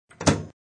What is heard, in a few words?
Latch, Quick snap of a metal latch closing.; Latches (Sound Effects:Objects)